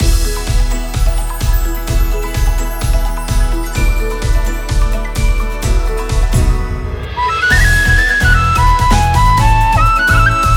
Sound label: Breeze loop, BGM